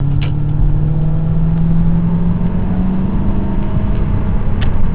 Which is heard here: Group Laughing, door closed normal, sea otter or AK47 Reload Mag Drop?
door closed normal